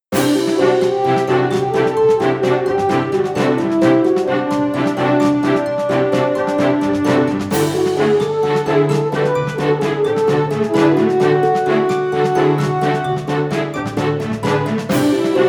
ファンファーレ; mitrasphere; 天海釣ファンファーレ

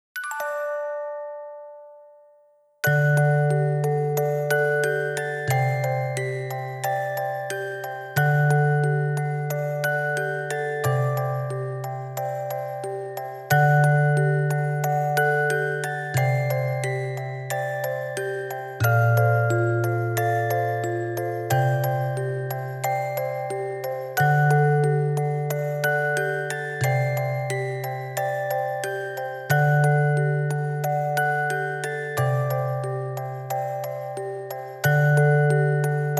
オルゴール Ver.; Fifthのテーマ

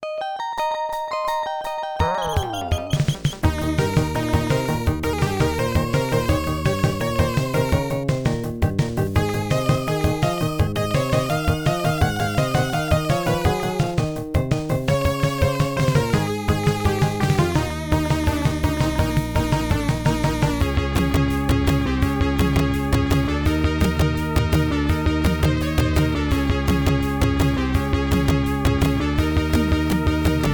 サーフィンシーン FM音源版; fray msx remastered soundtracks 2008

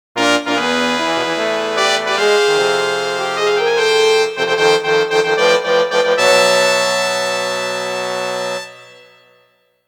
最後の舞台のファンファーレ; Monster Farm 1 2

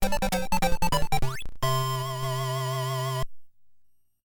イベント PSG版; fray msx remastered soundtracks 2008

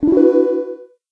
決定; misao

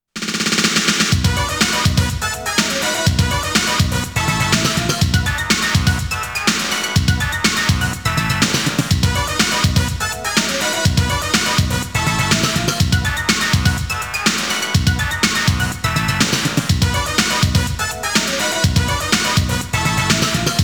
ネーミング BGM; konami amusement sounds 93 summer

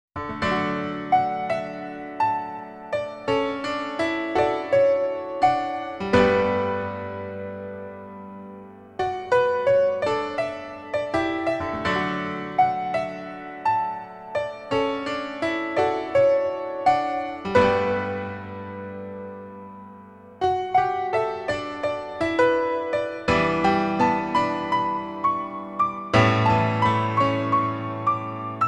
ピアノ; Hokenshitsu e Youkoso

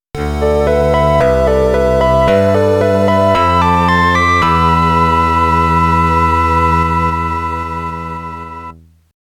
ファンファーレ; Sorcerian

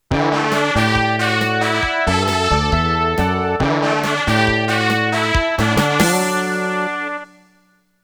ファンファーレ; Alvaleak Boukenki; PC-9801

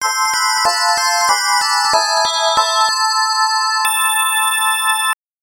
ゲームオーバー; Noble Mind; X68000

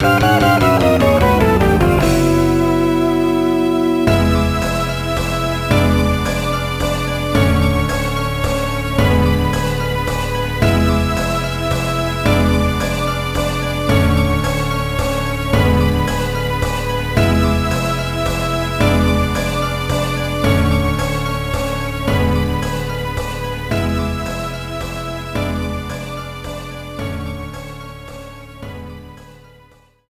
ゲームオーバー; Medarot Game Sound Archives Vol.2

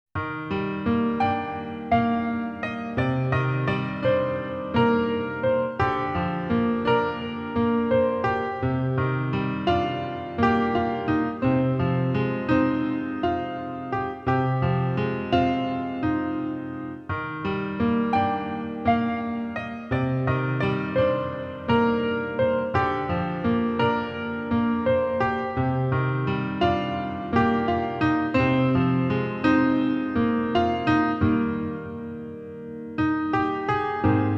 ピアノ; Hokenshitsu e Youkoso

